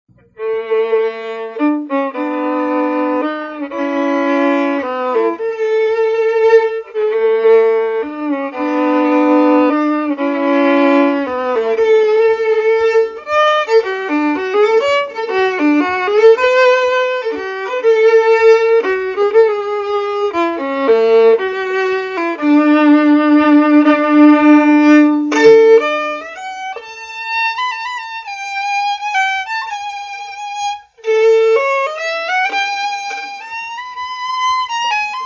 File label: golden fiddle waltz d